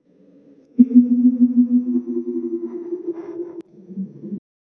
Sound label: a gentle scroll swipe sound; warping